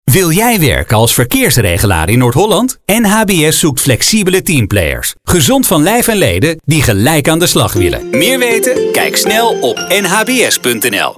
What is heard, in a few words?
Jingle